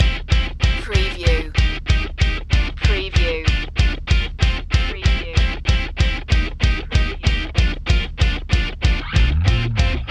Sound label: punk shuffle loop